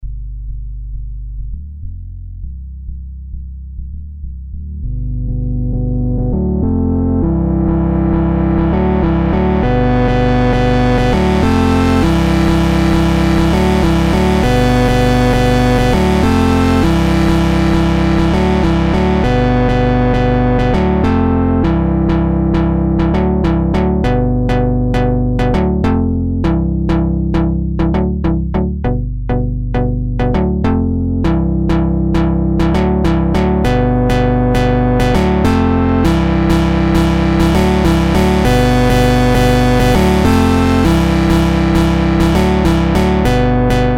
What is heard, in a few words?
SP12 CHORDS Pitch Down